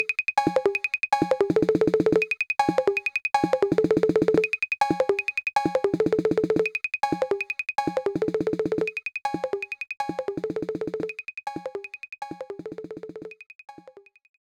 Piano Processing...

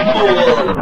Baiker death

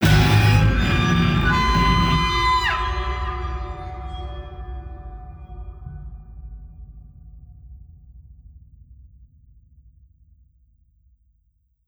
Shock; Black Mirror 2 Reigning Evil

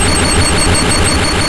Thunderbolt; Pokemon; Gen 2; attack moves; GSC

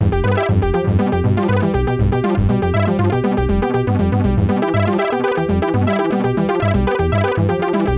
Clockwork Machines - Electro

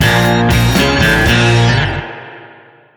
Sting Finish (Bb)

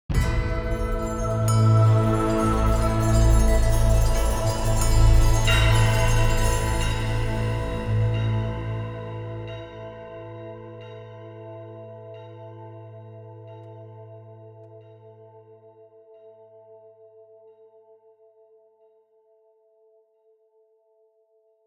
howls Bell Puzzle Solve Entrance Open; howls Origin

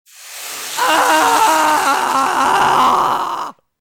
Radiator; This Is the Police

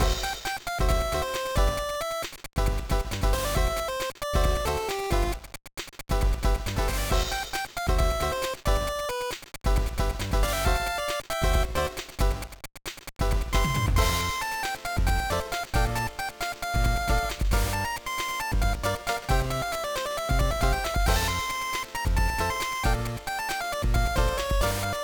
BGM; Sentouin Yamada Hajime; GBA

